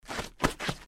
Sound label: Stumble; The Adventures of Puss In Boots - Catch The Thief; NextlevelGames